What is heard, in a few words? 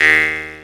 Jaw Harp Ee; Planet Earth; Digital Sound Factory Planet Earth